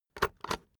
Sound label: puncher punch paper